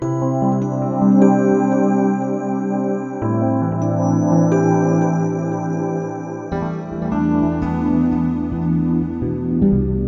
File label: Syntiano Velocity Foot Controls